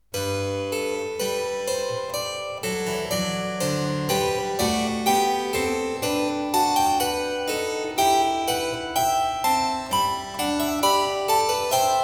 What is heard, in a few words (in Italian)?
Variatio 9 a 1 Clav. Canone alla Terza; BACH; GOLDBERG VARIATIONS